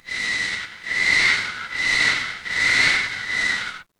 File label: mechanical gear